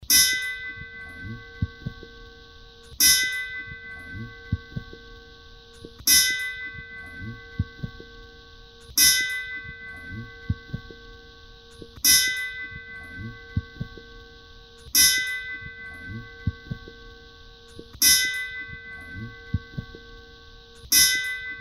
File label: 11 o'clock toast chimes